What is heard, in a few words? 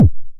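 909 BD tape, kick drum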